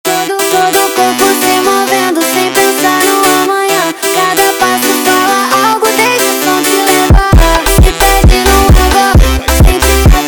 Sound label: Funk